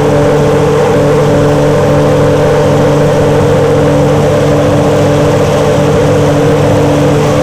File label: idle, Ferrari; F1; FSR 2011